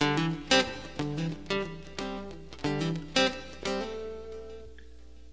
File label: mello echo guitar melody; GUITAR LOOPS